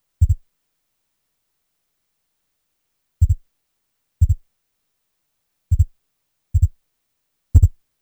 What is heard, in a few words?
030bpm; elektron machinedrum